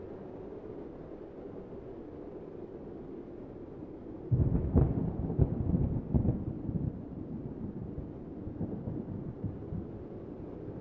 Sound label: wind